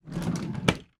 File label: office small desk drawer close